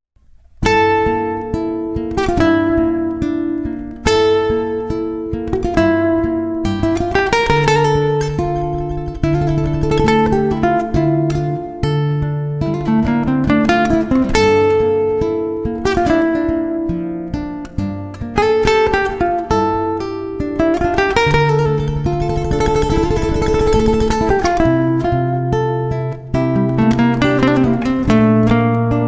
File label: instrumental